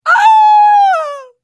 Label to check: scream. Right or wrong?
Right